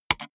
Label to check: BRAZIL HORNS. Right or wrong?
Wrong